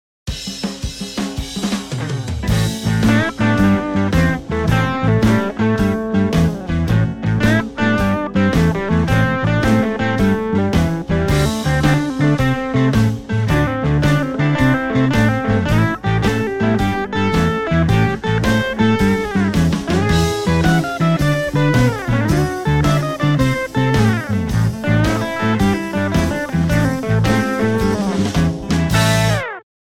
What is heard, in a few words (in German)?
Intro instrumental, Bluesgitarre; Intro / Einleitung